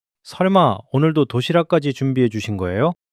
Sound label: surprise